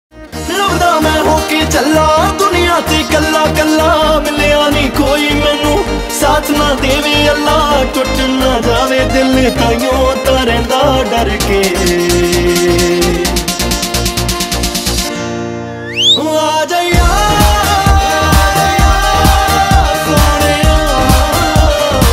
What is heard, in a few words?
punjabi ringtone